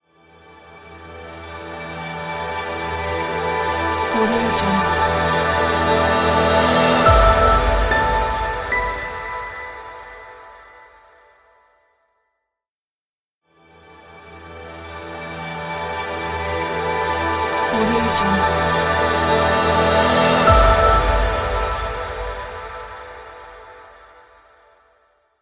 logo reveal intro

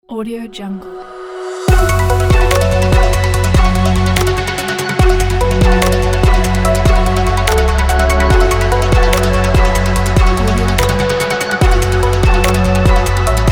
abstract idm logo intro

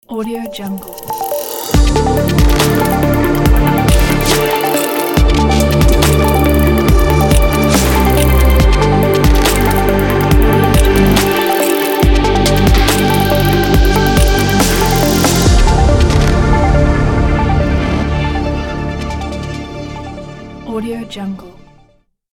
abstract electronica logo intro

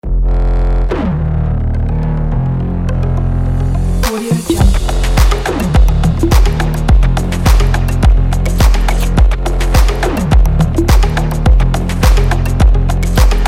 gaming intro logo

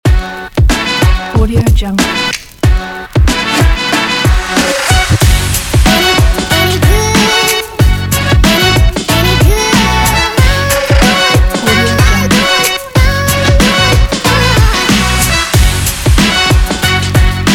rap music

